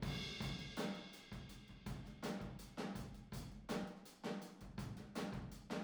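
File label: Boogaloo, Room Stereo, 82 BPM; Drums; Verse; Sonic Foundry; Drums from the Big Room The Mixes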